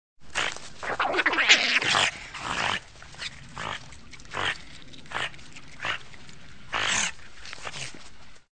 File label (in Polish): wydra